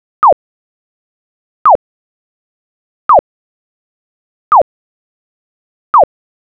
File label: Modified call